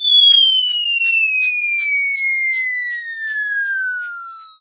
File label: falling sound